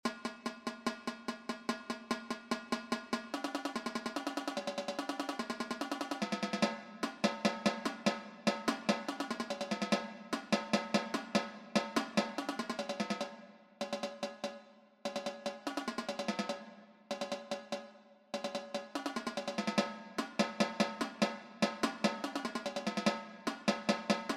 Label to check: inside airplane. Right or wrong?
Wrong